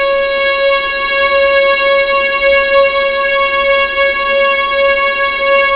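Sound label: C#3 legato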